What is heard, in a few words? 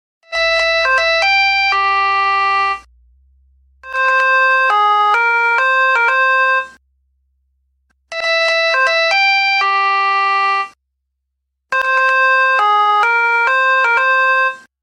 Nokia Tone